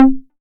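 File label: 808 mid conga Tape Sat, Tape 808, Conga; Goldbaby Tape808 & Tape909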